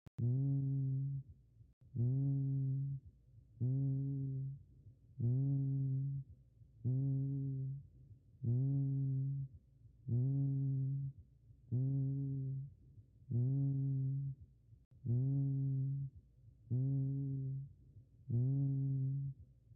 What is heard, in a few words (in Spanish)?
Egofonia normal; Exploración del sistema respiratorio